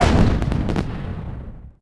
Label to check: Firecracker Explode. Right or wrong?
Right